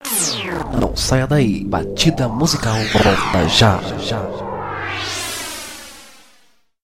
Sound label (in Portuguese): batida musical